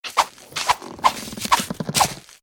burrow

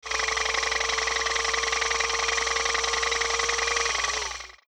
Golf Moving Barrier

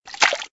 splash

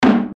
match drums

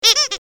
clock

